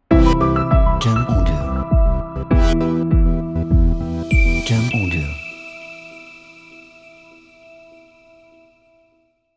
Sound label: Hi-tech Future Logo